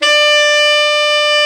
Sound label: BRA TENOR, SAX; Best Service Gigapack 1; BR SAX 4